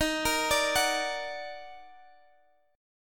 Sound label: Eb Minor Major 7th